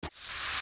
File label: Steps Push Crawl Lava; zelda; OOT